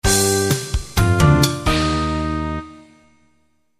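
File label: synthesizer; le djingle transitoire, programmation midi; Cuir Girls; notre série de bricolage débile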